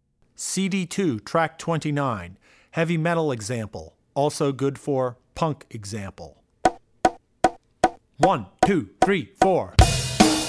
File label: Heavy Metal - Heavy Metal; Drummer's Bible Tracks